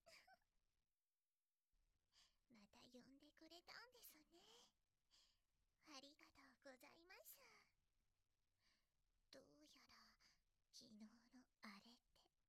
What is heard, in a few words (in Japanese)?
分身耳舐めフェラ, ASMR; 二日目